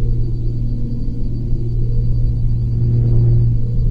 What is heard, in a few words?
hyperspace progress, shuttle